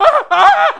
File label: WELDER ON FIRE